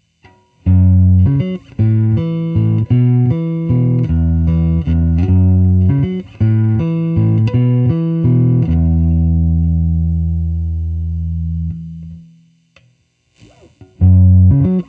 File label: black n gold test amp fuzz, Aria Pro II SB bass guitar